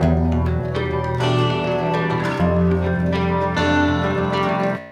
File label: AMB GTR, AMB SOUNDS; AMG; Global Trance Mission VOL-2